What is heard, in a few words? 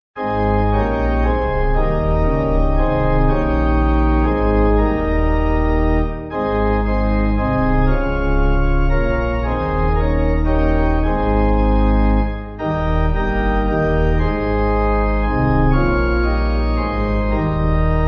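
Pipe Organ, Music Backing Files for Churches; O Spirit Of The Living; Mendon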